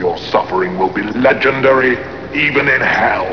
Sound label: suffering